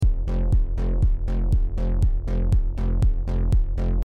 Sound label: Kick Bass Sidechain